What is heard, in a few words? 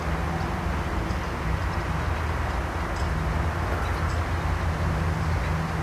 outdoors quiet birds, ambient; Source Engine; tf